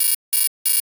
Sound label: shelter person alert; Original TK Sounds